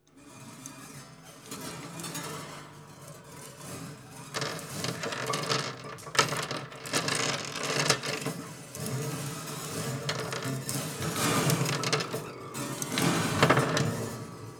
Marble Roll Rattle, Piano Destruction

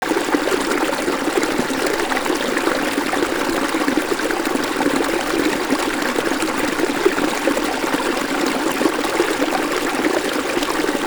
River, Static Microphone

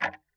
Tick, Clocks Kitchen Clock

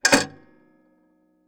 PayPhone

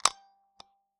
Taxi Meter

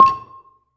Gambang Soft Beater, Gamelan

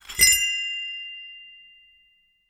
Hole Saw Rattle, Hole Saw Bits

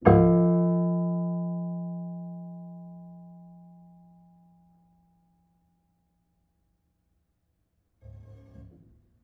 Piano Harmonics, Harm Low Mid

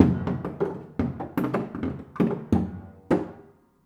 Body Thump Dense, Piano Destruction

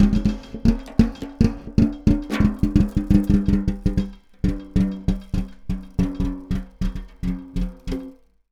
Snare Drum; Percussion Samples

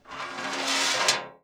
Coin On Metal Tray

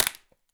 Palette Breakage, Wooden Palette

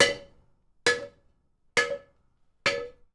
Hitting, Workshops

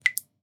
Drips

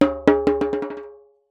Jembe Bounce; Percussion Samples

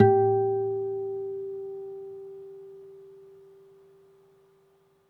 Harm, Classical Guitar

